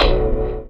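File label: chord phaser, Stabs; E Lab; XTc Files of House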